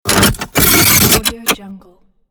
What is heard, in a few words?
mechanical sound